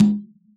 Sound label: jungle kit bongo; Dr 202 Kit 1; Percussion